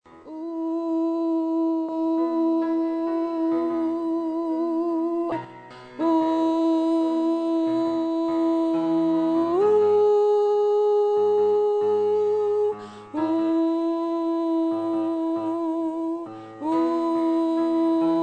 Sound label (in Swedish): bas